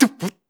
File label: Beat Box Scratch